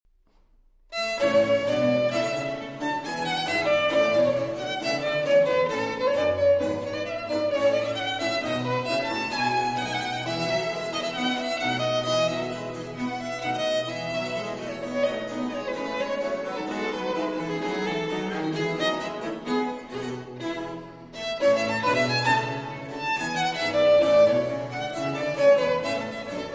Violin Sonata in B-Flat Major, Allegro; Sonata F.XIII no 16, RV34

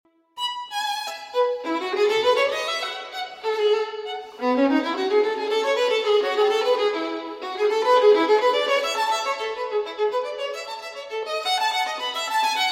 Violin Partita in E Major Gigue; No. 3; BWV 1006 VII.

